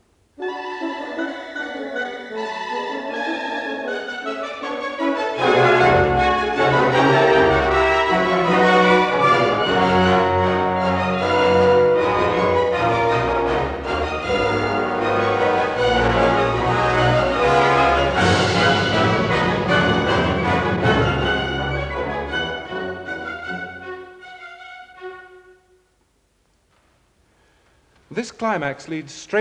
Music Example (Orchestra)

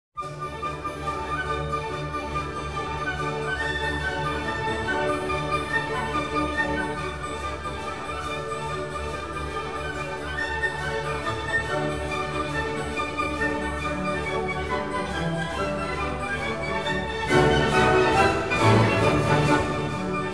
Hungarian Dance in D major, Symphony Orchestra; Brahms Hungarian Dances; Istvan Bogar; Budapest Symphony Orchestra

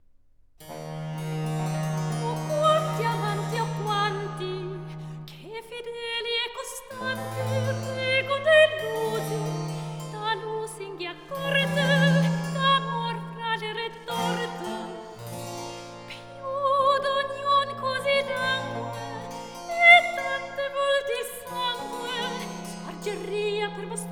Cantata, Recitativo; RV 678; All'ombra di sospetto; Vivaldi - Bellezza Crudel

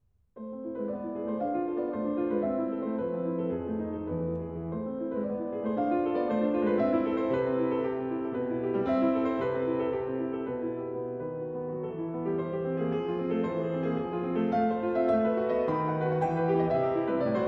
Allegro agitato in Am, Piano; Mendelssohn; Lieder Ohne Worte; The Piano Collection

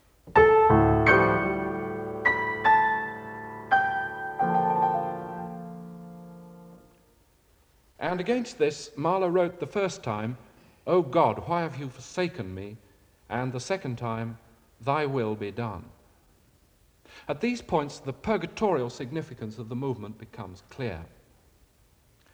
Music Example (Piano)

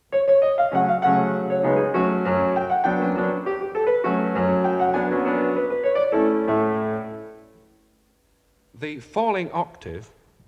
Music Example (Piano)

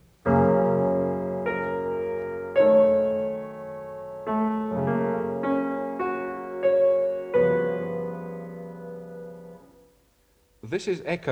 Music Example (Piano); Deryck Cooke; Illustrated talk, Symphony No.10

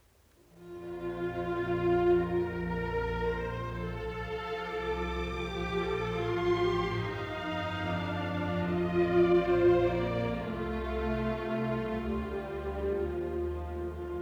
Music Example (Orchestra)

